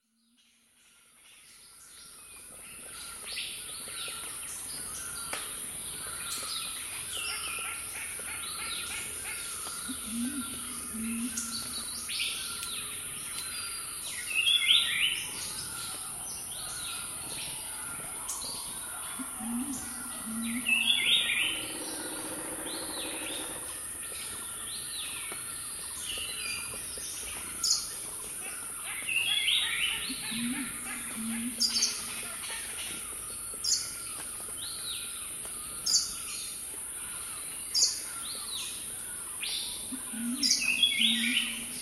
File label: hill forest habitat; soundscapes